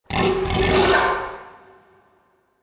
Battle Dog Enemy Spotted; Animals; Vocals; Snc Battle Dog

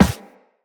Run Metal, footsteps; Characters; Operator

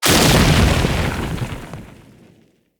Random Lightning Discharge; Characters; Enemy; Abilities; Nimrod

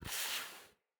Pet Snort; Catbrow; Pet Ship

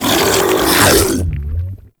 Grendel Bowl Devour; Warframe

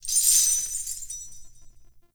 Binaural Chains; Warframe; Shadowgrapher

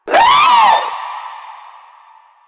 Proto Infested Runner Vocal Short Attack

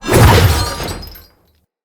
Sword Whoosh Heavy; Weapons; Enemy; Swords; Mnd King Swords

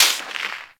Bullet Whizzby

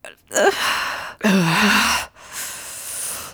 Fight Breath; Cinematics; Warframe; TheNewWar; Throne Room

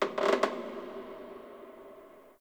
Wooden Creak Small; Warframe; Ambience; Nineteen Ninety Nine

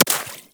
Bullet Impact Water; Warframe; Weapons; Bullet Impacts

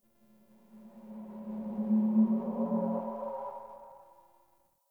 Backwards Whisper Yell; Ambience; Warframe; Entrati

